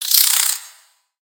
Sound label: Spider Exert; Lego; creatures mechanical